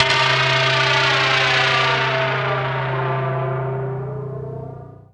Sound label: FX SWEEP